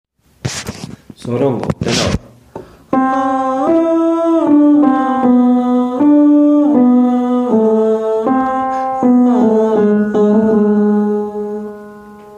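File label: zorongo tenor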